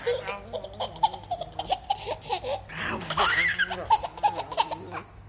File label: Baby laughing